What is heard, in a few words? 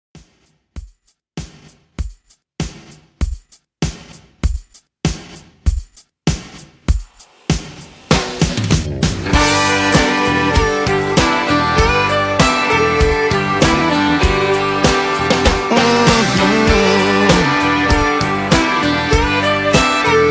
Country 98Bpm; ReDrum